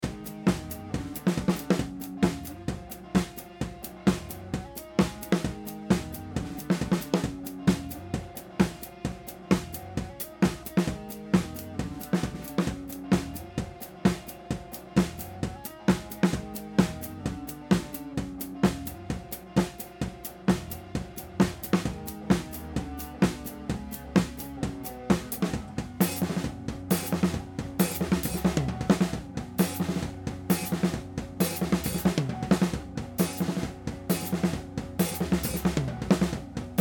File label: Separation Overheads